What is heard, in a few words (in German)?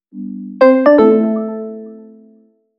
Standardgong